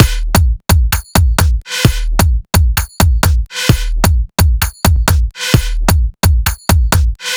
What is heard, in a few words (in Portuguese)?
BEAT RAVE DO MALOKA